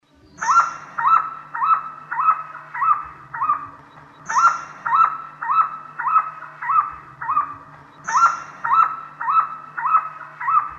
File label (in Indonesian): suara poksai botak